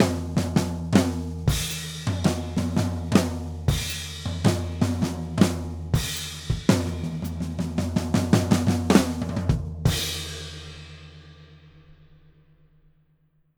Drums Ending, Blues; Digidesign; Pro Tools; Pro Tools Essential Demos; Essential Loop Demos; Demo Session

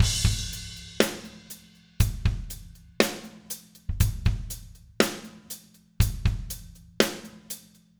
060 Ballad Drums Verse; Pop Rock; Slow Tempo; Digidesign; Pro Tools; Pro Tools Essential Loops

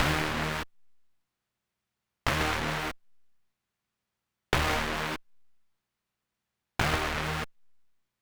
HipHop Synth D#Min; Digidesign; Pro Tools Essential Loops

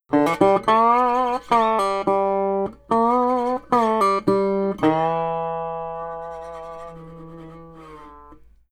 Country Dobro Intro; Digidesign; Pro Tools; Pro Tools Essential Demos; Essential Loop Demos; Demo Session - Country

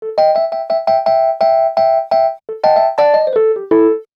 085 NuJazz MarkII Amaj; Piano; E-Piano; Pro Tools Essential Loops; Digidesign

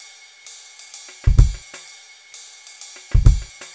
064 Jazz Swing Drums; Trad Jazz; Pro Tools Essential Loops; Digidesign

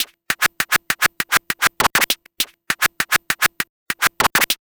Vinyl Scratch Clap; Digidesign; Pro Tools; Pro Tools Essential Loops; Dance DJ

